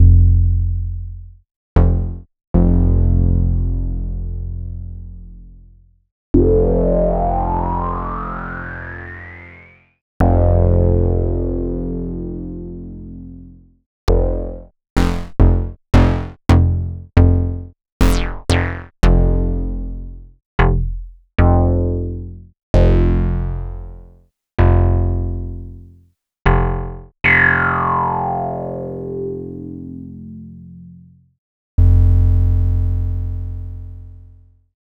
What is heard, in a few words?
5U Modular Bass